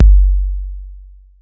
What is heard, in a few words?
BD 808